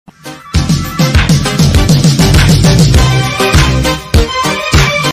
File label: walking follow music